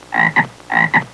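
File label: bullfrog; halloween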